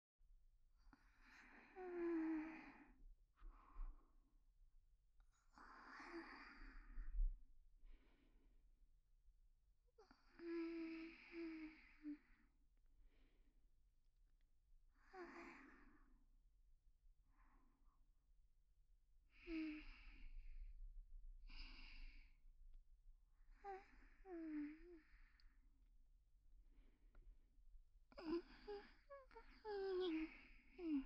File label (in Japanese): 寝息・彼氏と一緒に穏やかに眠っている彼女; おとなし少女系カノジョ